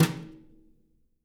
BRUSHES, Living Drums

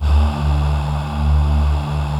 D1 MALE AH; Northstar - New Gold VOL-2

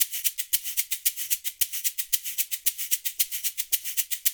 LOOP, SHAKERS

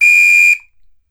WHISTLE, Steel Percussion; World Combos; E-MU Producer Series Vol. 7 – Old World Instruments

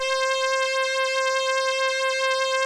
ARP VIOLIN, OLD SYNTHS; Rubber Chicken Software Sample Bank

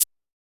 808 Mcs, Roland MV-8008; Roland MV8800

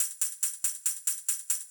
beat - tamb, grime, 140bpm; Zero-G Sound Sense Grime Scene; Construction Kits; Its Primetime

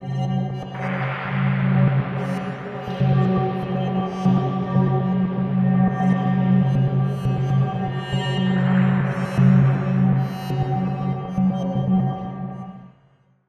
Dark Synth, cinematic drama soundbeds; musicradar

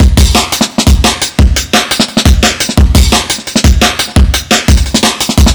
E Beat, Electronic Beats; Drum n Bass; Loops; Beats and Percussion; KJ Sawka; Drum n Bass & Beyond

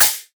CH PERC, MISC PERC; USB Soundscan vol.60; Asian Traditions; AKAI

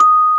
MUSIC BOX D#3; AKAI S-Series CD-ROM Sound Library VOL-8

